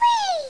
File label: whee; Santa's Workshop - Advent Calendar for Computers